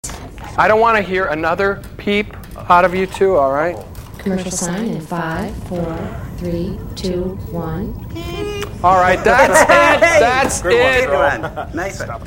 peep

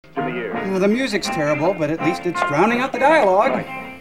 music

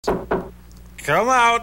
hallway

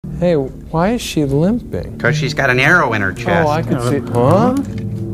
huh

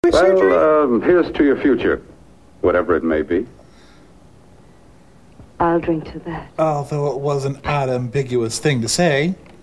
drink

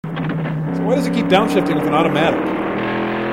downshift

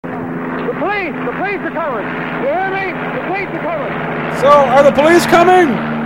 police

